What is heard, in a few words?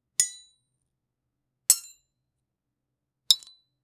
asmr audio of a single, cutting